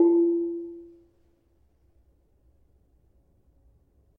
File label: Barung 4 F, gamelan tuned